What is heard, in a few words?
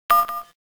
BOMB Beat; WarioWare Inc